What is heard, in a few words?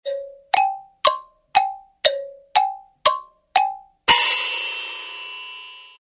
xylo broken clock